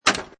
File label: Pinball Sound Bumper Knock; Video Games